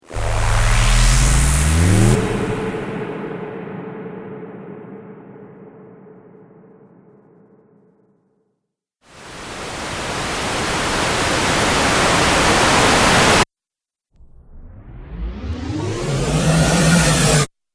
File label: Cinematic Riser Pack; Rise And Dive Sound Effects